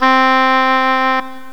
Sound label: english horn, reed